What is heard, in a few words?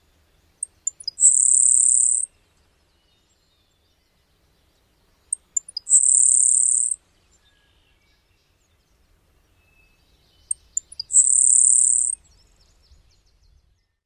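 Grasshopper Sparrow typical song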